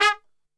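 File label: G#2 trumpet shot, Brass Super Section; Best Service; TRP SHOT; 90 s Sample CDs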